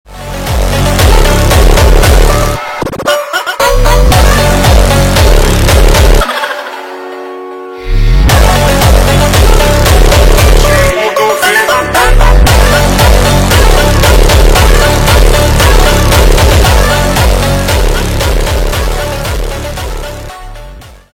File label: hardstyle remix